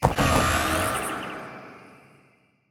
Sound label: Death Action; Mother Nature